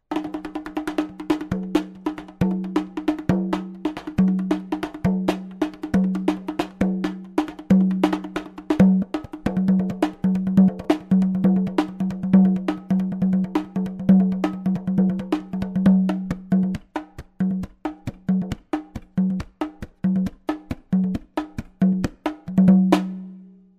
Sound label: Bongo